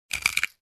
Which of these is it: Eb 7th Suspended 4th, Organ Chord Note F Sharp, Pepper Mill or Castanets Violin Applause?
Pepper Mill